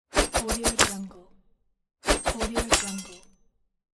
dagger throw on ground

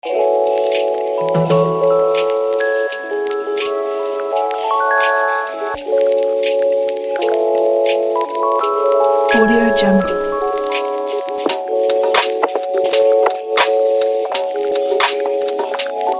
autumn lofi beat

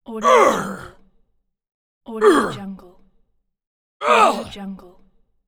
aggressive moan

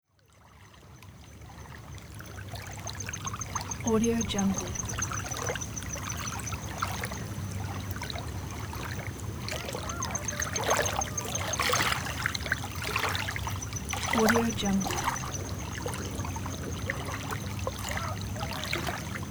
calm lake daytime ambience

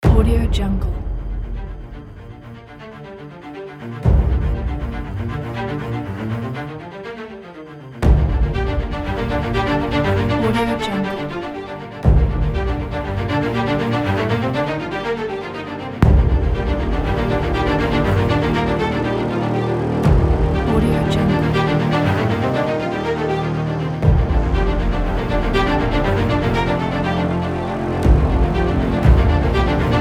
cinematic inspirational drama kit

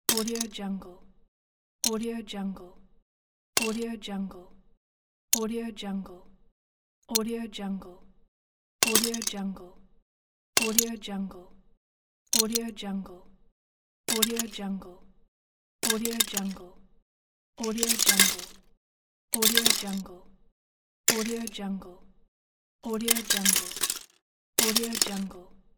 dropping plastic toys

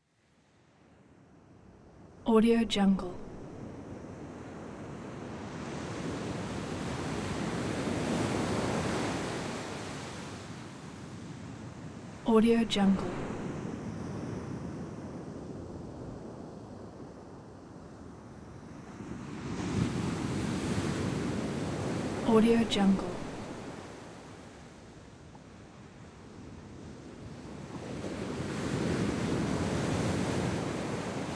waves rocket engine sweetener

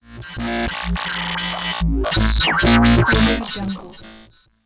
synth bass wavetables; soundmorph

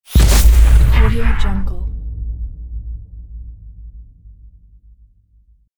furious transition; soundwood

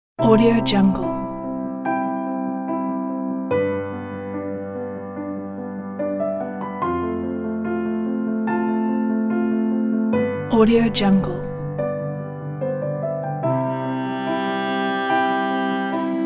positive emotional background

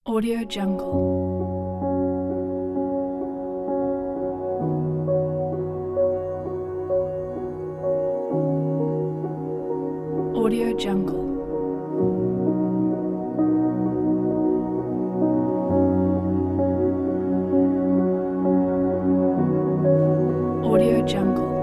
emotional sentimental piano

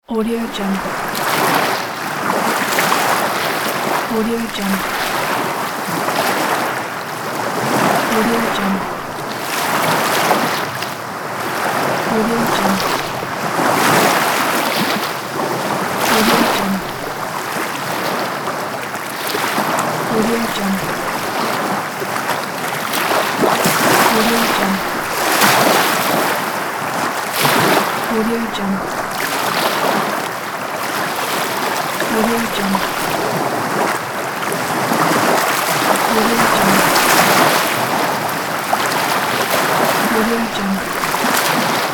beach ambience with waves

